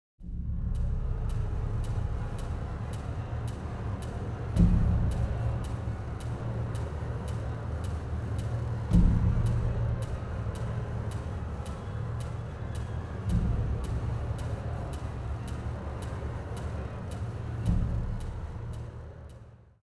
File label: Exploration Tension Layer